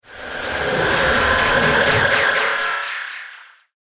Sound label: Warped Air Whoosh